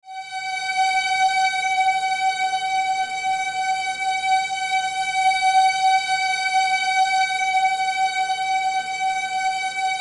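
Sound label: High F sharp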